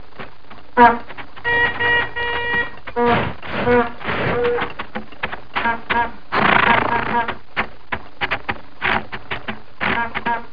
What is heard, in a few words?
JALOPY, Cartoon; 2000 Sound Effects